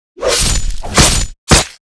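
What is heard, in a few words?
Attack Claw; Seer; GamigoNA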